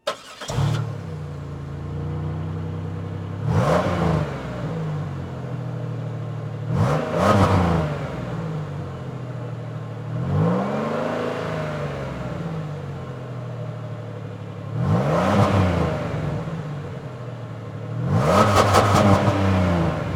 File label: Transportation Car Monte Carlo Start Idle Rev Off Mic In Rear